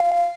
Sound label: fed beep access; interface